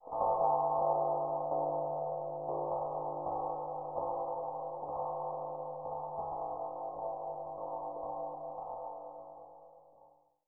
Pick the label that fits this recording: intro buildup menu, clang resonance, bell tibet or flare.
bell tibet